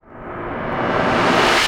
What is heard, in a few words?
NOISE